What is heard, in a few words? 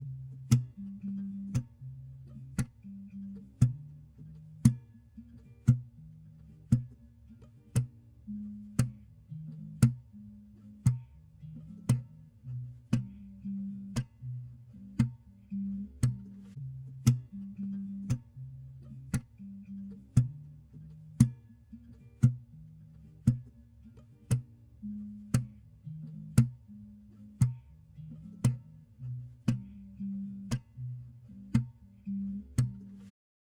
116 BPM C Min Guitar; Loop Stems; Cymatics; Encantadora